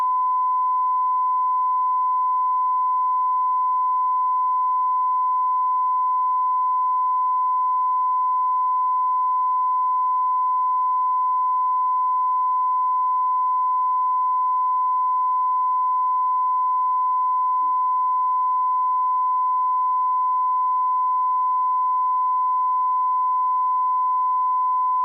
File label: Calibration 94dB